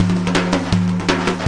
134 mideast surfer roll

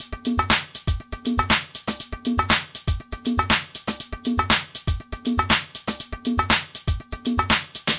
my conga luvs drum machines, 120 bpm

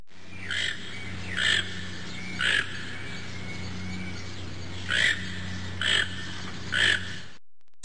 toucan; Zoo and Nature

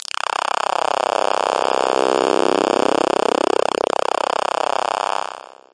notch pass synth

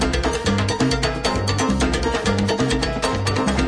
piano congas latin; SAMPLED MUSIC LOOPS

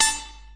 electro saw

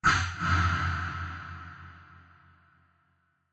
drumsplosion echo kik

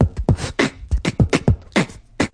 beatbox loop, 103 bpm; 101 to 110 bpm

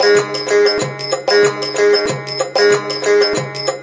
125 triplet feel ethno strummer; WORLD LOOPS

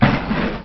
Trash Can

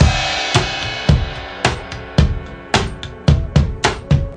109 moody rock, drum loops; 101 to 110 bpm

